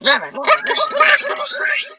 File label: Baki Beard Laugh Normal